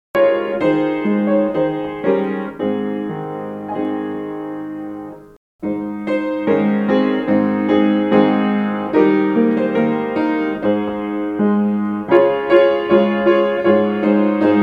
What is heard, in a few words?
Dare To Be A Daniel, piano; Standing on a purpose firm, heeding God's command... Dare to be a Daniel, Dare to stand